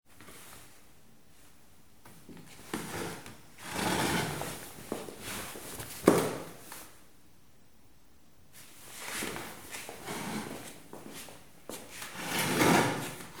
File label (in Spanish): Silla Madera